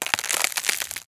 ice crack; abilities

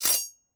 dagger in, weapon

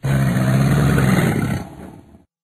sea crocodile angry

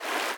fast water, river sounds

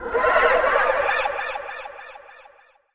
phoenix angry

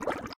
running water, river sounds

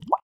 running water, river sounds

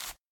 snow step, footsteps

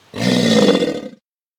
alligator angry, utterance

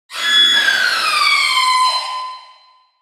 wendigo angry, utterance

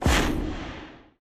swoosh; abilities

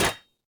block

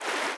fast water, river sounds

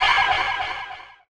phoenix hurt, utterance

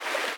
fast water, river sounds

